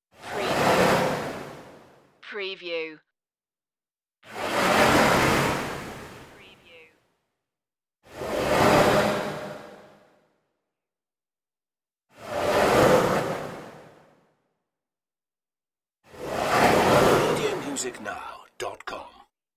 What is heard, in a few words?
fast floating whooshes